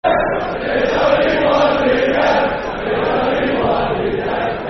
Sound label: Football Chants; One Lee Camp; Queens Park Rangers Qpr